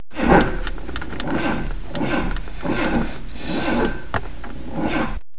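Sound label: weak battery; 8bit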